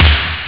Pop Snare

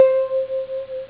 Dream Bells

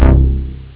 Pulse

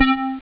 Ex Bells

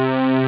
Korg String

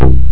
DX Bass

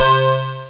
Chink

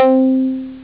Koto

